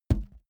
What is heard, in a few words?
balloon hit hand